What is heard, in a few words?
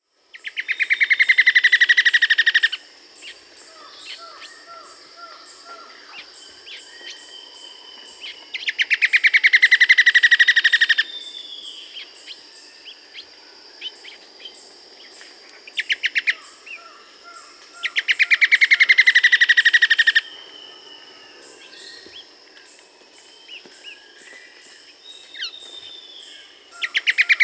White-flanked Antwren (Myrmotherula axillaris), Peru; S. America